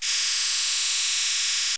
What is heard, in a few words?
psk 2